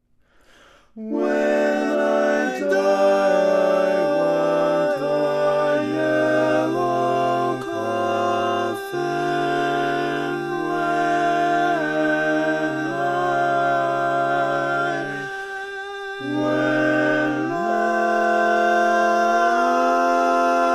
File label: Tenor, barbershop